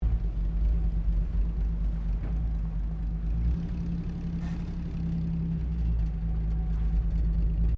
CAR HOLDEN HD PREMIER IDLE PARK, Classic Australian cars; 1965; Cars (Sound Effects:Vehicles)